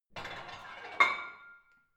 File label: barbell plate put on hit ring, fitnessstudio; sport freizeit